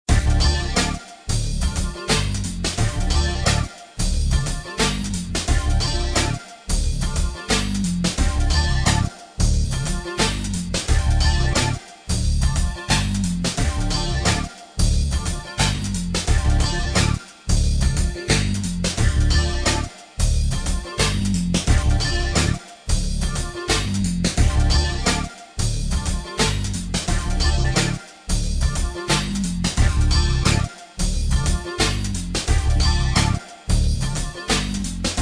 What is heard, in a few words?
groove